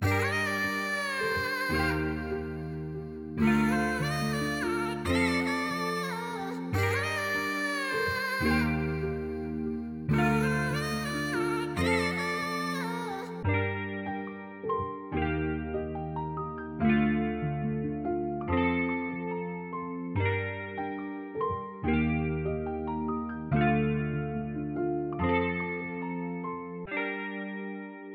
143bpm

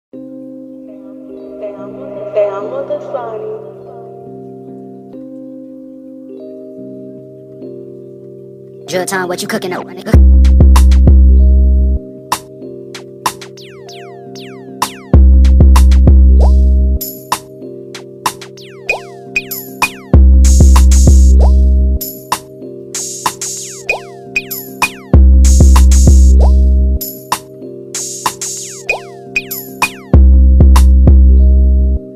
Instrumental

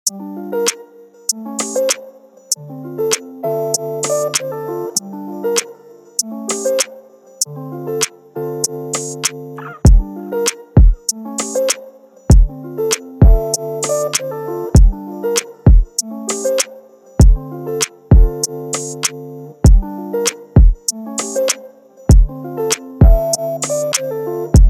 Instrumental

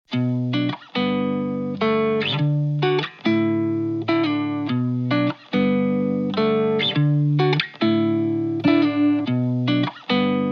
instrumental, alternative instrumentals; rock instrumentals

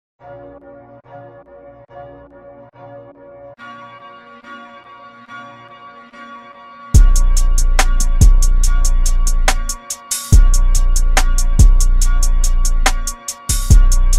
Instrumental

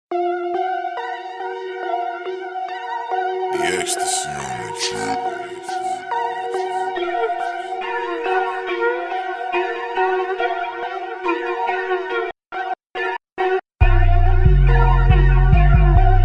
Instrumental

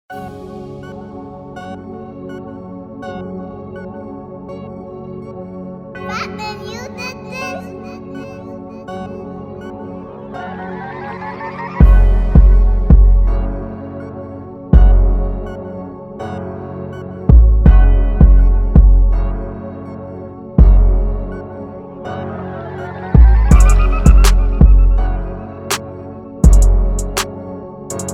Instrumental

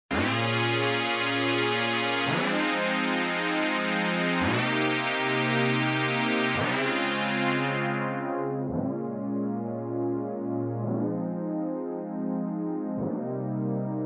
Instrumental

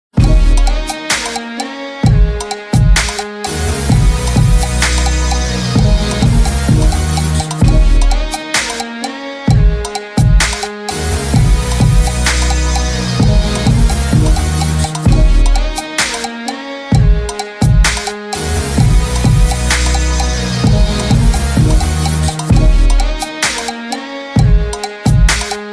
Instrumental

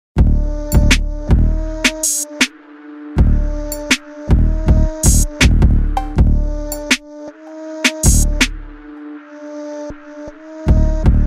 Instrumental

